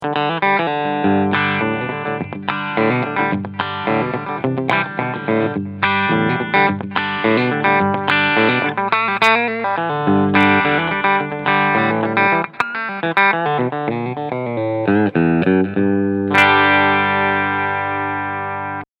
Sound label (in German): Chapman Guitars ML3 Pro Trad Clean Sound Steg PU; Gold Metallic